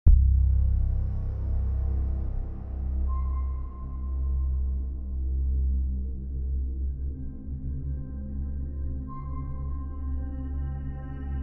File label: Sci-Fi Ambient Music